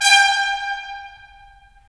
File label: BRS, Tps Stacc; Roland - LA Composer vol. 4